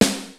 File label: XD-5, DRUMS PERC, BOSS; Rubber Chicken Software Sample Bank